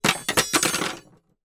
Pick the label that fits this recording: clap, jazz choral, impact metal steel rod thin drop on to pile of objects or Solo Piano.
impact metal steel rod thin drop on to pile of objects